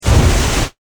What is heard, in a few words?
Flame Thrower Burst Short; Warfare; Miscellaneous Combat